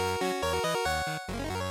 GB RPG Victory Intro

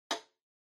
Metronome Low Click Single

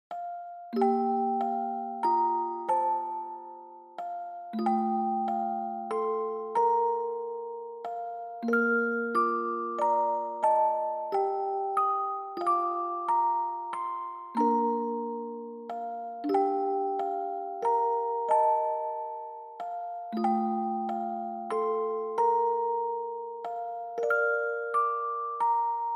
Reverb Fast; bgm; Happy Birthday To You

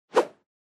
Motion Swish; モーション スイング

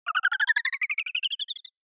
Motion Slide Up Slow

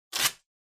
Duct Tape Peel Off

